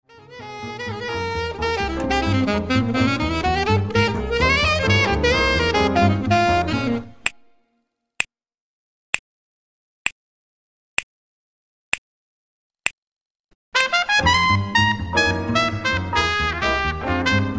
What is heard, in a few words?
minus drums snaps